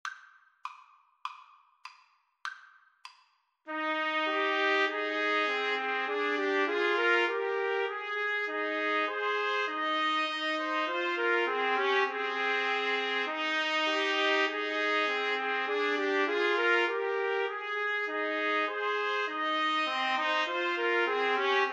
TPT trio, Trumpet Trio

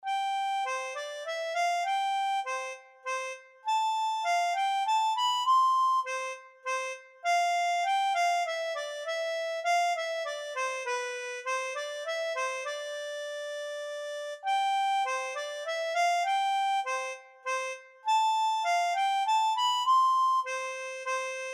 minuet, harmonica; bach

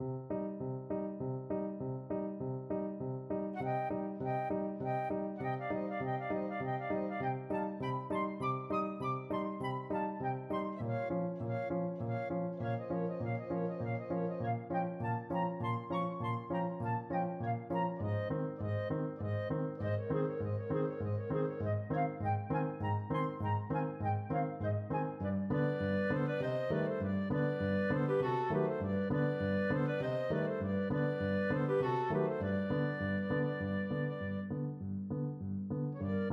chanson boheme flute clarinet duet; Bizet, Georges - Chanson Bohême from Carmen

